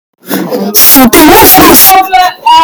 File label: bass